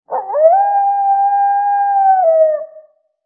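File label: animal wolf distant; modern warfare 2; amb elements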